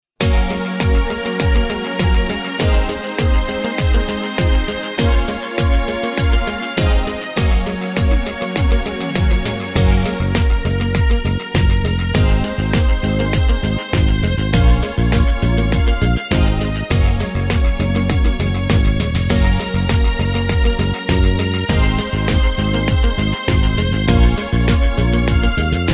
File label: Reggae Medley, Korg PA800; demo song